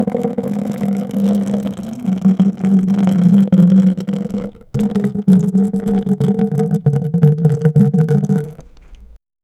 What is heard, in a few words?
sharp heavy squelch, liquid